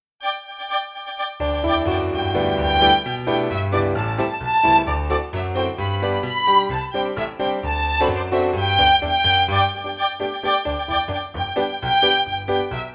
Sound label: Old Brass Wagon instr